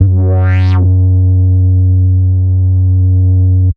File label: Tech Bass F#1, techno; Bass Multi; musicradar